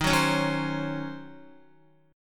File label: Eb Minor 13th Eb down Guitar 4F,4A,5C,5G,5C,6E; Ebm13 strummed; JGuitar